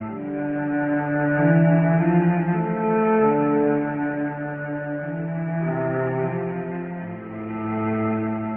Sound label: strings, Sad Loops